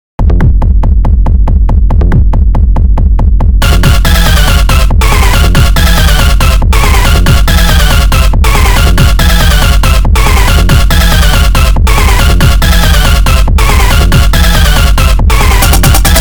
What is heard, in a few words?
healthy beats